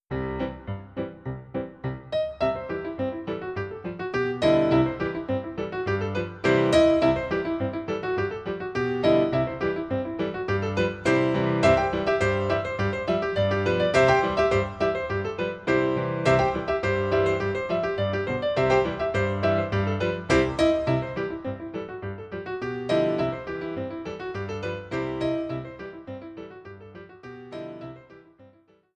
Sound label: Petit Battement, Reel; Chicken Reel; Trad.